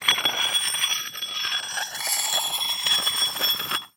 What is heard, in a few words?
Hole Saw Scrape; Hole Saw Bits